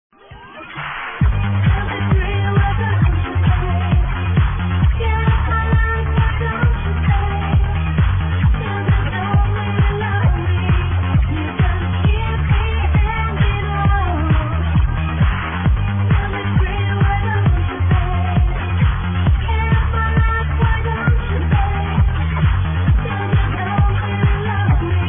80s tune

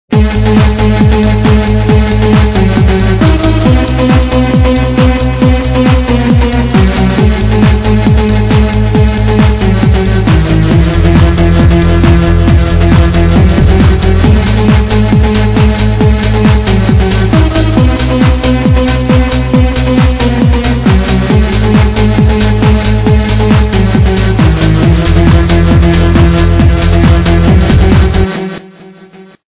old trance track

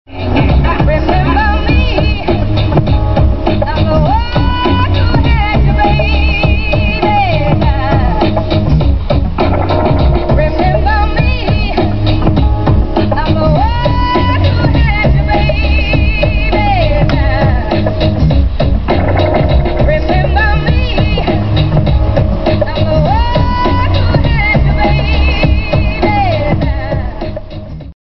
unknown chillout song

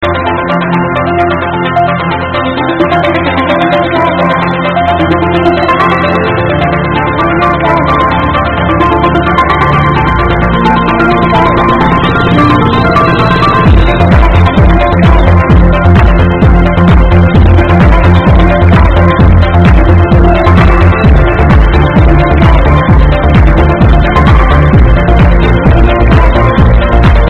Japanese Trance

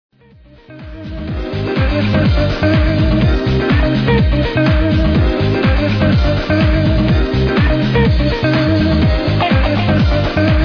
unknown trance tune

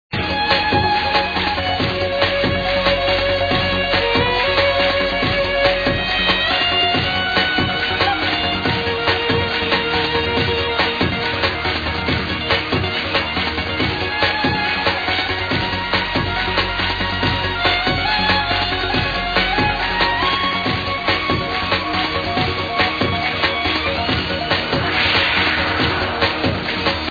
violin

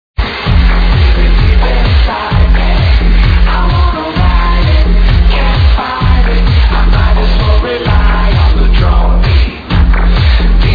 dark beat

